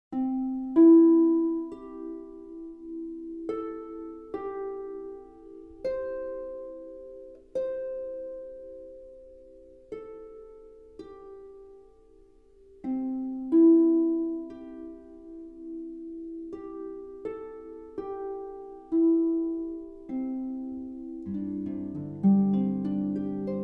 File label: Instrumental Harp Praise